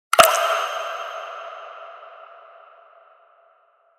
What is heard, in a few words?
spiritual